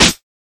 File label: perfect acoustic snare sample e key